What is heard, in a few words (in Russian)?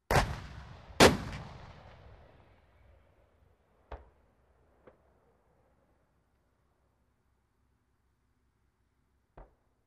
tankovij ogon